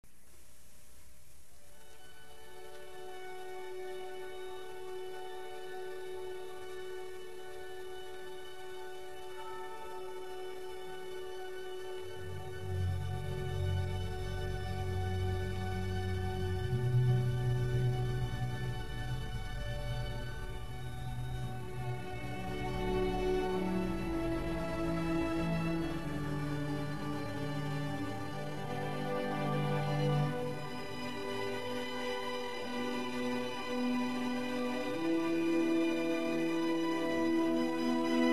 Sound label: viola fantasia